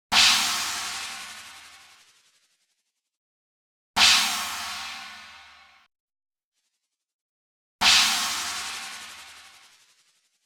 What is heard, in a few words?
H910 harmonizer; Eventide